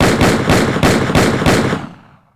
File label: Gun, Hand, 44 Magnum, Empty Clip; gun and weapon sounds; captain audio; fully loaded